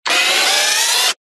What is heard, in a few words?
science fiction robot servo motor whirr operate movement